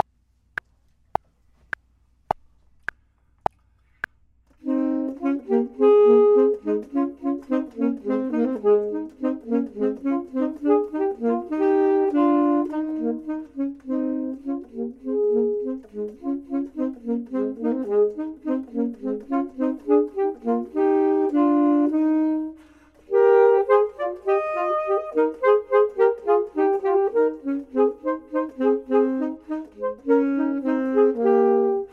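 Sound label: both, Saxophone and Clarinet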